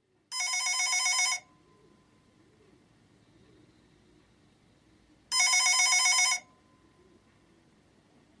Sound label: phone